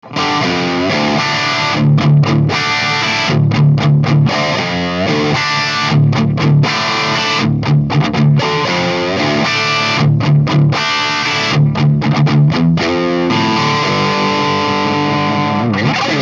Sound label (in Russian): Запись микрооконечника через кабинет и микрофон; Микрооконечник для игры в линию версия 2